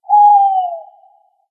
owl call single

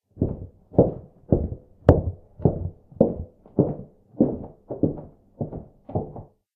footsteps upstairs female

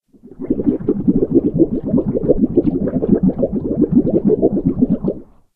water bubbling deep

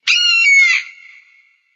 eagle shouting

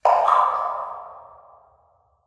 water droplet echoing

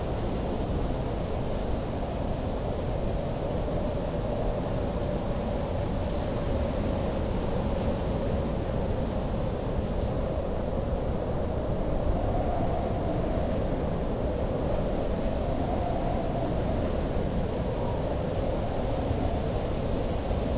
wind night

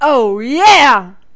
hehe

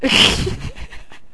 hehe

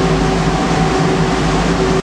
air conditioning

